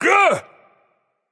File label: surprised; smite; voicepack; xbalanque